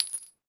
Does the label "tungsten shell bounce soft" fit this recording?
Yes